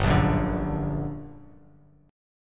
G Minor Major 7th, Bass Standard; GmM7 strummed; 3,1,4,7; JGuitar

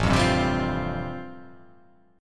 A-Minor 7th Sharp 5th, chord; Am7#5 strummed; JGuitar

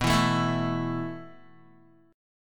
B 7th Suspended 4th B, Guitar; B7sus4; strummed

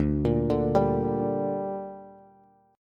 D Suspended 4th Sharp 5th D down Bass, chord; arpeggiated

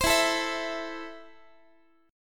E Suspended 4th Sharp 5th E, down, Guitar; Esus4#5; strummed; x,x,15,14,13,15

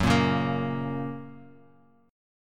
F sharp Major, Bass Standard; strummed; JGuitar

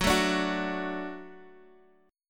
F sharp Minor Sharp 5th, Guitar, Drop D; chordshape; down

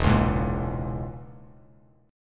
E 6th Flat 5th Bass Standard, E6b5 chord; strummed; JGuitar

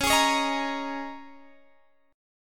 G sharp Suspended 2nd Suspended 4th, guitar chord; x,16,18,18,16,18

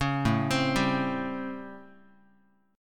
A 7th Sharp 9th, Guitar; A7#9; arpeggiated; JGuitar

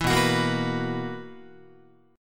B Major 7th Suspended 4th B, Guitar; strummed; 7,7,9,8,10,10

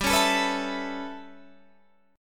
A 9th G, Guitar Standard

